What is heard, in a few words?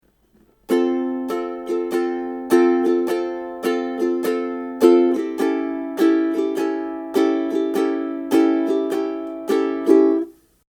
Simple Strum, ukulele